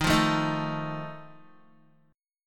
Eb Suspended 2nd Flat 5th Eb Guitar 4F 4A 5C 5G 5C 6E; Ebsus2b5 strummed; JGuitar